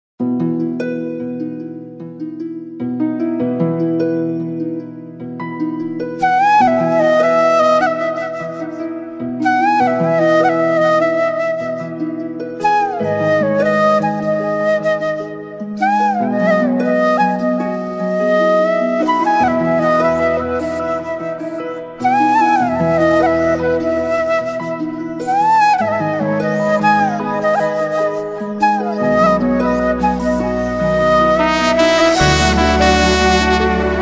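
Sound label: Sad Instrumental; Tv Serials; Zee TV; Dance India Dance 3; DID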